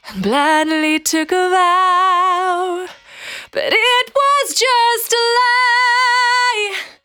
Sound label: Cry 68bpm B, Vocal; Zero-G